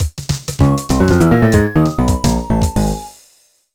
Lose All Mini-Marios! (4th Floor Death); mario vs. donkey kong 2 march of the minis; mario vs. donkey kong 2 minimini daikoushin; nintendo ds

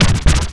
DJ Disco Scratch 1 beat; Plants vs. Zombies Battle for Neighborville

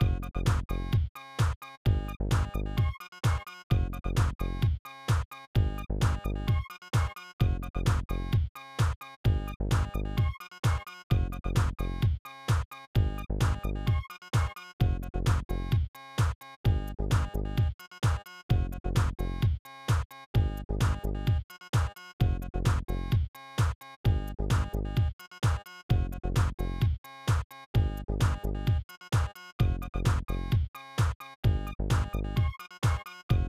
Game Music; NBA Hang Time; SNES

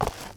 Cardboard Foot Step

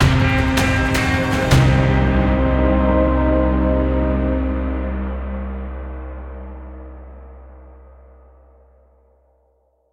Ambush; Griftlands

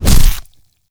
critical melee target

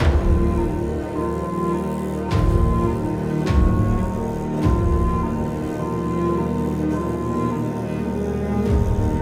blizzard shortloop; song of iron

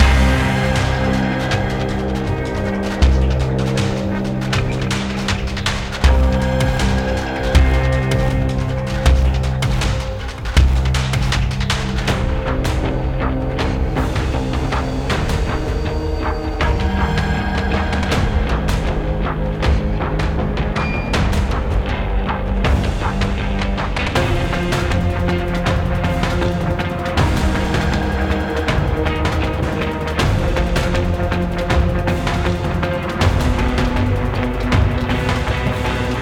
Combat; Gl Rook Combat; Griftlands

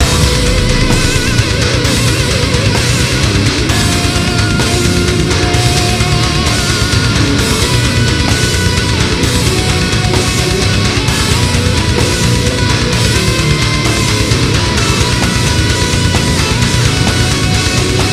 HEAVY GTR LP; transformers war for cybertron gamerip; IACON INVASION

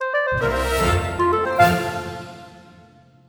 Level Complete; one finger death punch 2

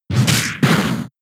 Tackle; Pokemon; gen 7; SUMO; USUM

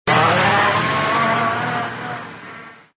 Car Reverse; Extra Lives

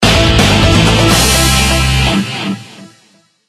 Jingles; otome the exorcist

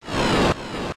Dark Pulse; pokemon reborn e19 2022

